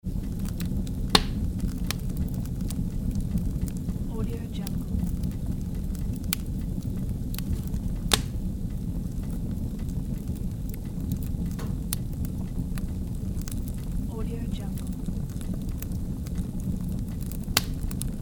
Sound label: fire burning in a wood stove